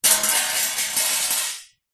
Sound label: metal basket small drop concrete; zapsplat